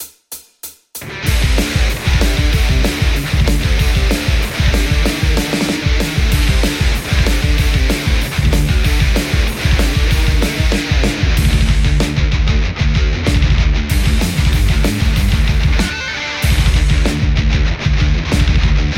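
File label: Telecaster; metal; scuffham sgear2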